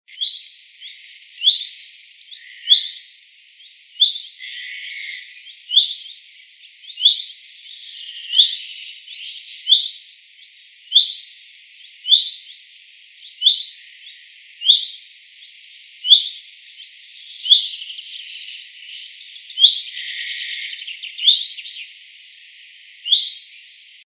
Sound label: Bullfinch; Camachuelo Común (Pyrrhula pyrrhula)